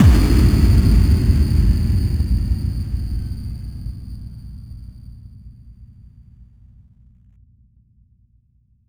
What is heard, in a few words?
FX Kicks